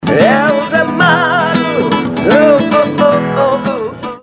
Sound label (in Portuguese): Vinheta Cantada; ZMARO